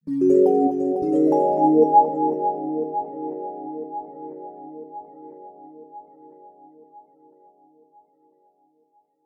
multimedia alert calm zen mellow soothing

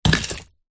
nature ice chunk med drop

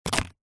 papadum set down stack on another

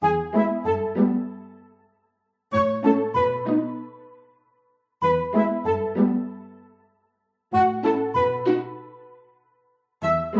music curious squirell 141; zapsplat

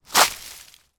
foley leaves dry kick with foot; zapsplat

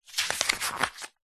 household book thick page glossy turn; zapsplat

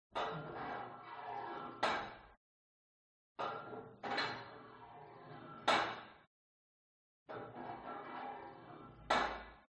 GYM Put Weight Onto Barbell Reverby; SPORT

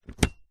car 12 volt usb convertor remove unplug; vehicles; zapsplat

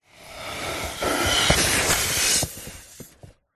leisure whoopee cushion blow up inflate

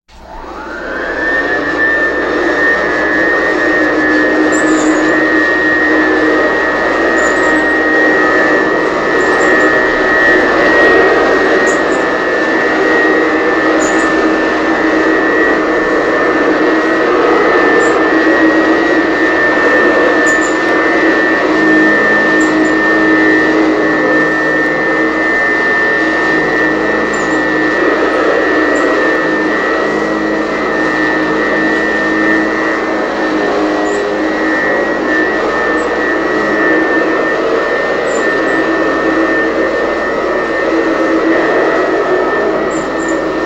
household food mixer mix master; sound effects one

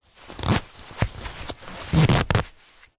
foley clothing body warmer nylon zipper short zip

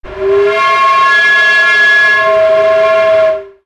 sound design effect air pipe pressure

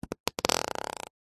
human fart dry forced quiet

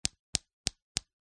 industrial hammer tiny wooden hits stone; zapsplat

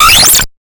sound design computer glitch

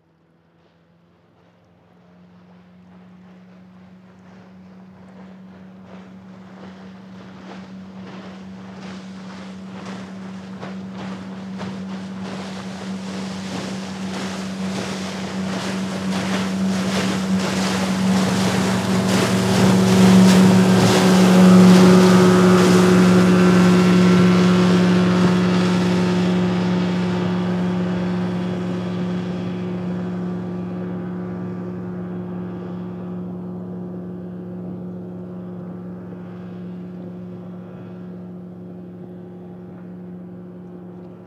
Dive Raft Pass By; Blastwave FX

